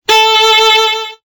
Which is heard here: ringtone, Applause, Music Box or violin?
violin